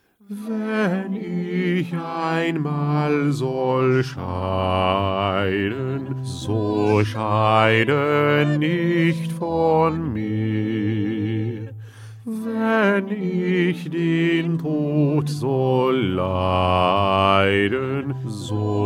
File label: Bass; Bach; St Matthew Passion